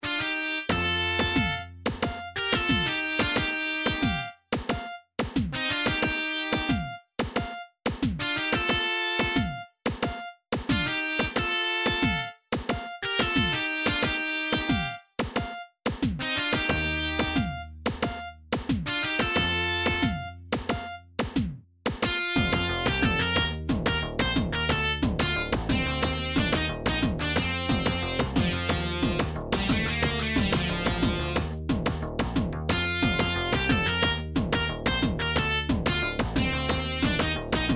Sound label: nintendo, midi; metal storm level 1